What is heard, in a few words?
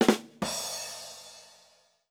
rim shot